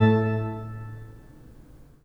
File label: PIPE, 3DOrgans